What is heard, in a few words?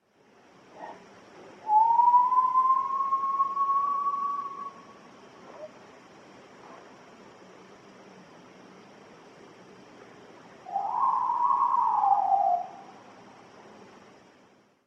Howl And Shriek; Michigan; Isabella County